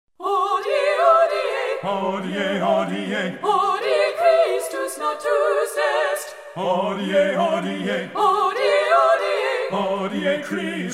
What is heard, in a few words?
fanfare for christmas, a cappella christmas